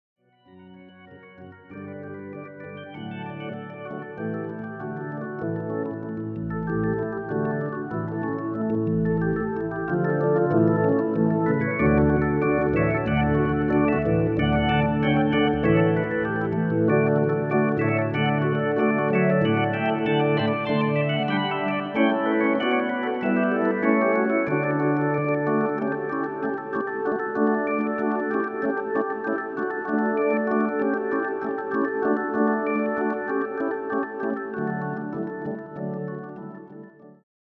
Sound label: fanfare